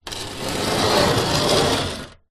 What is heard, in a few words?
wood drag scrape on rusty metal table; foley; zapsplat